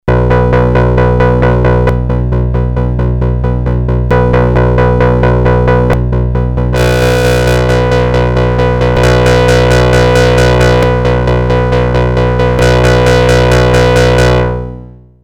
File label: test acid filter, novation bass station 2; acid filter mode; analog monophonic machine with subtractive synthesis